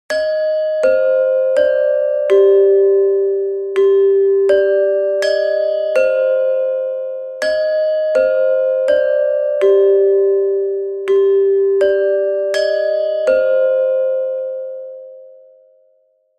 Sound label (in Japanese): マイナーチャイム